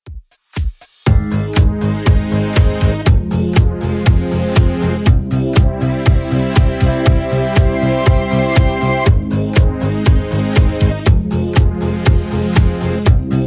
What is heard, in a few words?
80s Redrum 120bpm